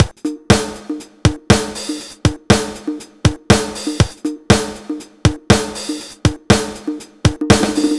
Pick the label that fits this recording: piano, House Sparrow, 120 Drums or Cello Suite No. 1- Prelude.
120 Drums